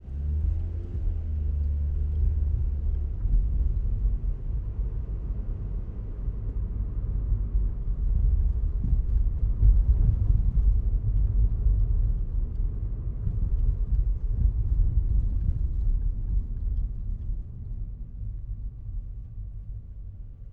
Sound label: Mazda, 3, Ride POV, Medium, Bumpy, Decelerate, Stop; Car; Airborne Sound; Vehicles Car Sound Effects 1 Elements